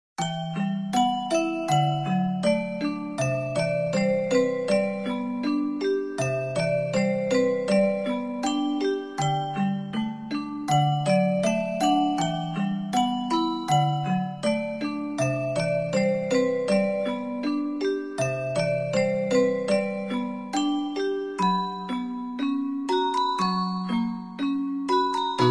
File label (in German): orgel; Drago Noka; PS4